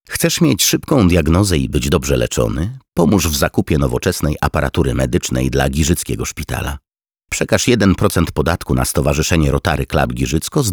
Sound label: Rotary C G